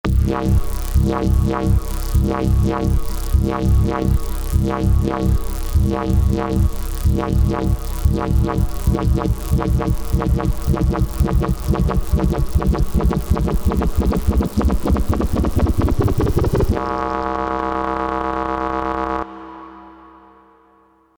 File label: korg z1; synth